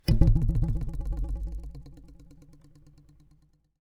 Ruler

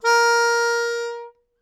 Melodica Long, Long Notes Chromatic

